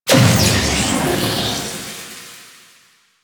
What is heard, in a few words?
Vaults Keyglyph Activation Energy Drain; Warframe; Entrati